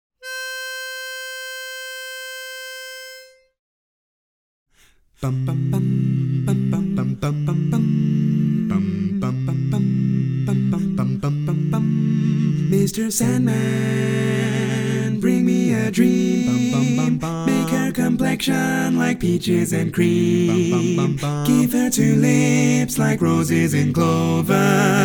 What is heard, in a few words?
Tenor missing